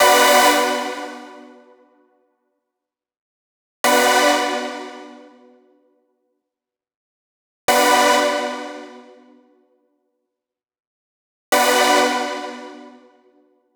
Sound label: 125 Bm synths, Complex Electro Synths; Prime Loops; Focus